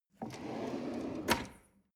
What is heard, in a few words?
drawer medium close draw